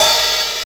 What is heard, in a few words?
Special Hihats; Vengeance Samples; VEC1 Cymbals